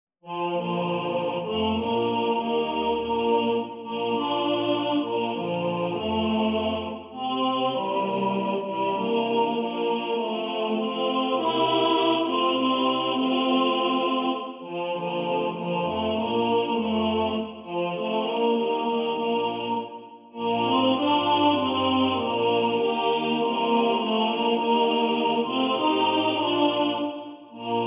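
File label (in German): Tenor 2 im Vordergrund, Männerchor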